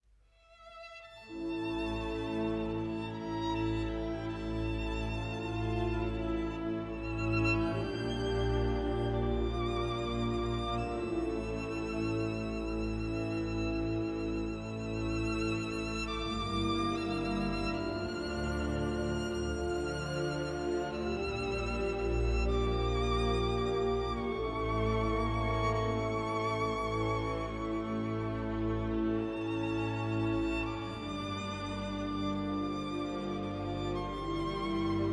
String; Badlands

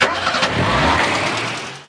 Old Pickup Leaving

